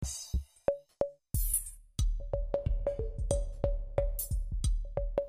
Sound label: Swingin' Perc Trip 91